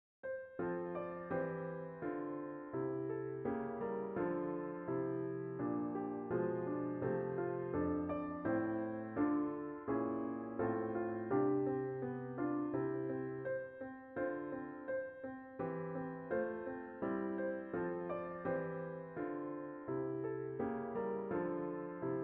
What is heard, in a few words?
The Eulenspiegel chord is used three times in this example